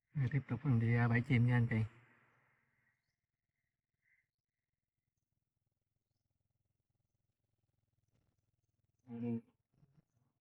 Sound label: vocals